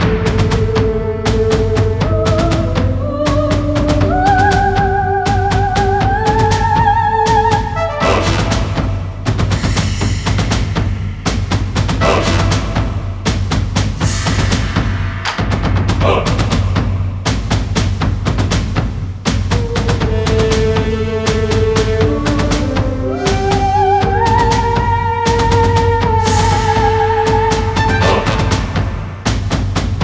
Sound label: TENSION LOOPABLE